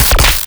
spark short

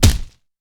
high velocity impact, player; Portal 2

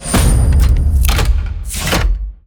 popup new weapon, ui; Counter Strike Model Pack; CS:GO; panorama

